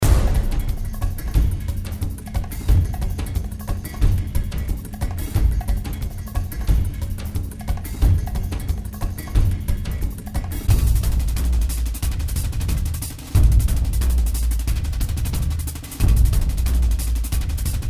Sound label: Zombie Chase